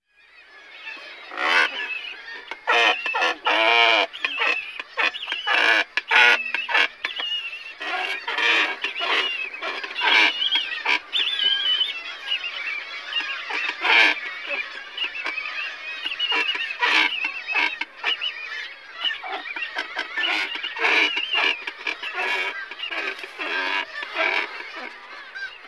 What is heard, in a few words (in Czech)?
Kormoran chocholaty Phalacrocorax aristotelis